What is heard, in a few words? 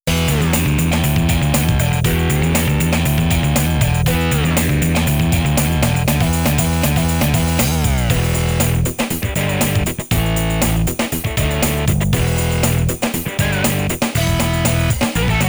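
good morning karaoke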